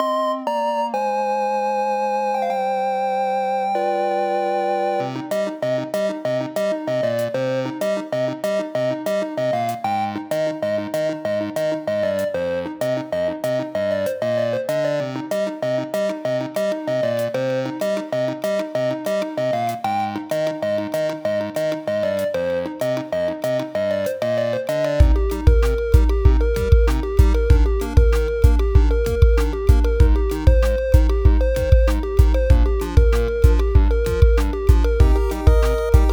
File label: Chiptune